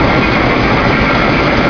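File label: engine; de kievpass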